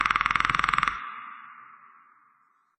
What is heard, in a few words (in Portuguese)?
chamado curto